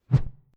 Plastic Hollow Tube Stick Sweep By Whoosh Airy Low Pitch